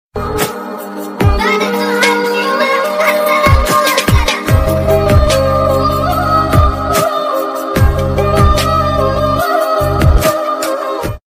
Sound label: arabic peace ringtone